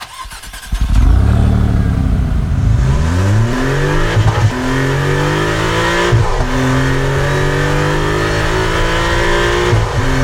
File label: start, mc20; maserati